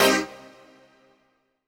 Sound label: HOUSE, House Dance